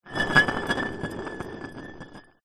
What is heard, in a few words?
glass bottle beer roll on concrete ground; foley